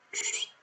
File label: whistle reset